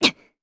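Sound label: Vox Landing Japanese; Hilda; kingsraid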